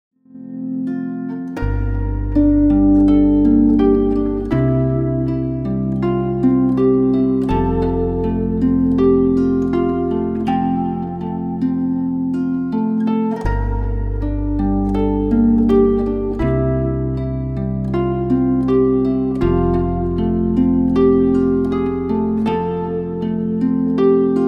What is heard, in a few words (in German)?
unplugged; abendstille